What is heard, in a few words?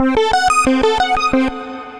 Cream Arp, Synth Rhythmic; Ableton; Skitter and Step